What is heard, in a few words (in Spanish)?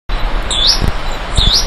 Picochato Grande; PP Salto Encantado; Jorge La Grotteria